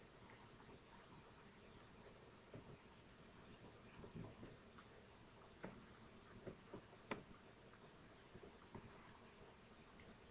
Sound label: rain